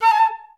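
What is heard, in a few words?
flutes A4; Best Service; Peter Siedlaczek's Advanced Orchestra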